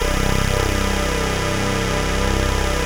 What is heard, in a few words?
POLY SYNTH; Wizoo - Powered Wave